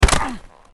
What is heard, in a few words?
hit; csgo